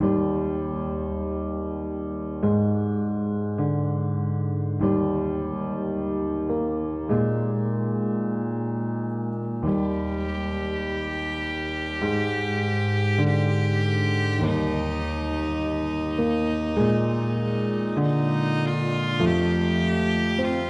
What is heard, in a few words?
100bpm soft piano violins